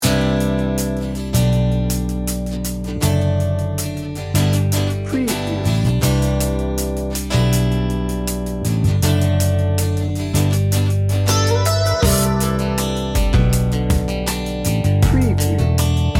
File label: laid back and warm no synth